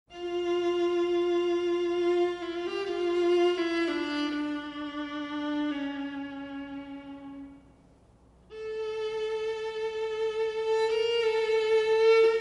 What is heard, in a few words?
Melody, viola; from Orfeo